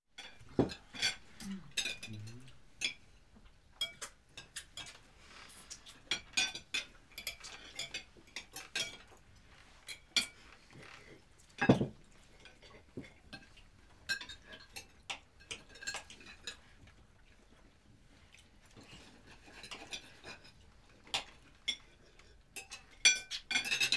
午餐、正餐(一群人)

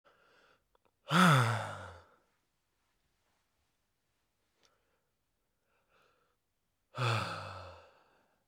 叹息(男人)

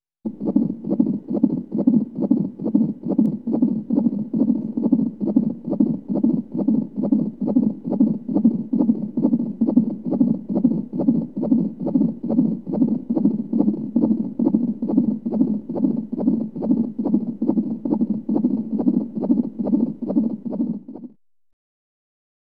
医院、婴儿出生前心跳脉搏

